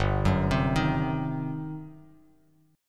G Major 7th Suspended 4th Sharp 5th, G, down, Bass Standard; GM7sus4#5 arpeggiated